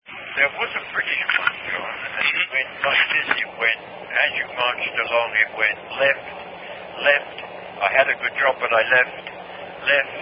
I Had A Good Job But I Left British Marching Cadence